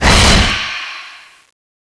zombie ghost stab, claw; ghostfury

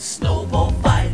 snowball; girlgamers

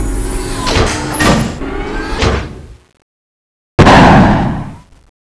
attack jump; mad machine

